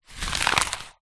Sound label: paper scrunch up; foley